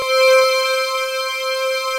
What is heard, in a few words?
TUBE ATK, TECHNO; Pro-Rec - Synthsonic; Z1 TECHNO